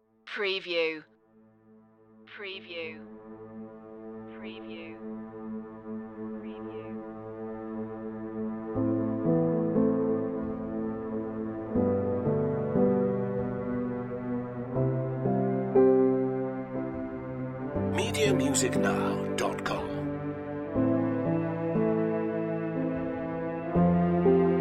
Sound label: relaxation no sound fx